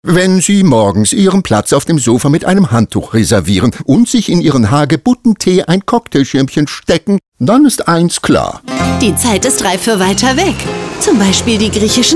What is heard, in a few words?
Funk; EUROWINGS DISCOVER